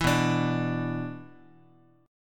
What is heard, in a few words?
B Minor Double Flat 5th B Guitar, chord; Bmbb5 strummed; JGuitar